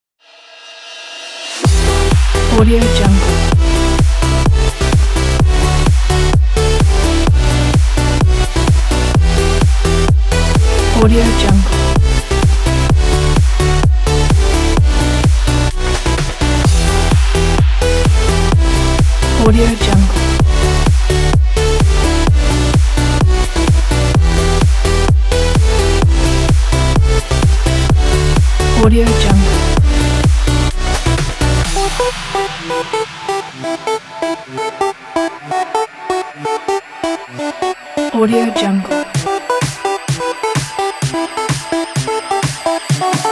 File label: electronic pop